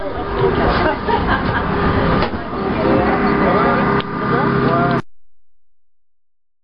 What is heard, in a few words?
airport; kamecity; newcaledonia